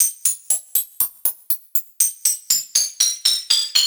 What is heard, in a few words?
buzz